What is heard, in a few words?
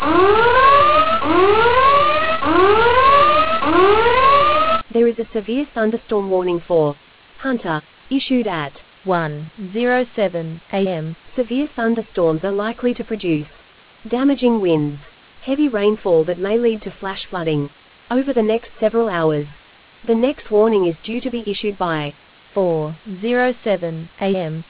storm audio